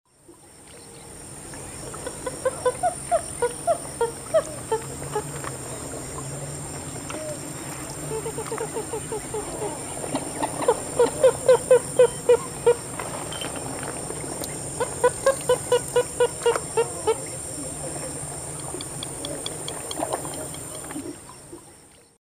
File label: Porphyrula martinica, song; S. America; Eastern Lowlands